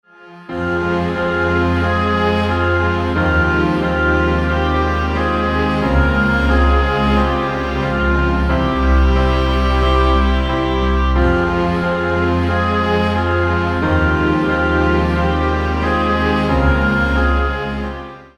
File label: Kyrie Elieson Lord Have Mercy instrumental